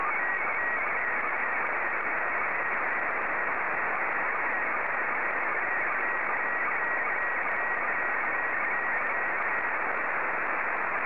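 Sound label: PD50, slow-scan television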